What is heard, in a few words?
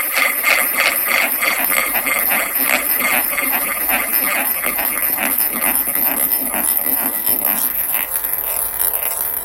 Bowl and ball